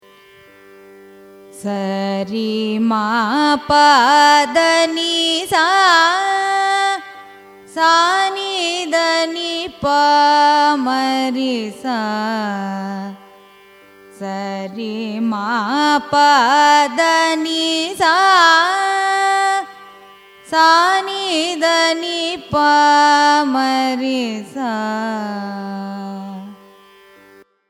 raga deva manohari arohanam avarohanam, carnatic music